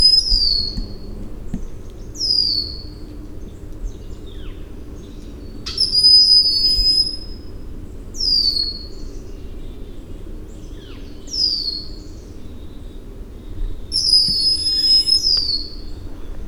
Good near bird adult and juv Broad-winged Hawk Nahma Swamp hiking trail